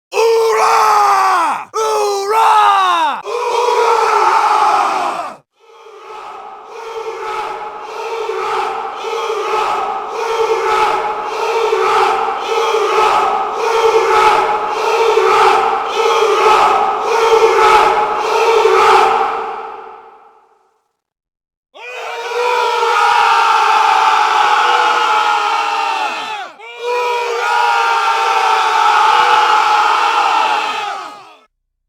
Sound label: chant